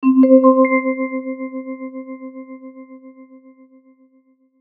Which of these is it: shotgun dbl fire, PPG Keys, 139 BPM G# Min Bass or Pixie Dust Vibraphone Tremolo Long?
Pixie Dust Vibraphone Tremolo Long